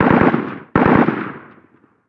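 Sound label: gun m16 distant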